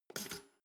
toaster pull up; maschinen

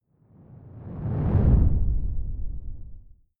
whoosh simple low grainy; swooshes-swishes

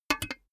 cash box metal lid close; maschinen; soundpool

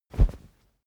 whoosh cloth soft dry; swooshes-swishes

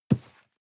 cardboard box medium grab slide hard; objekte

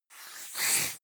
reptile hissing

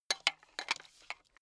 metal pipe handling low short

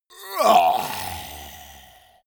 monster growl aggressive; kreaturen

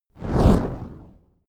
fire whoosh torch med flange

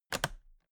toolbox latches close regular; industrie wirtschaft; handwerk

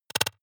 noise clicks; maschinen; signale; soundpool

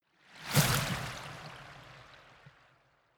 whoosh hard

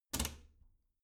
bathroom cabinet doors close soft; objekte; tueren

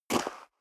step high heels gravel light single left; schritte; Footsteps; menschen

